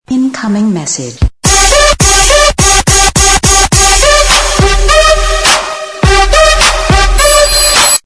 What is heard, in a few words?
Funny SMS Ringtone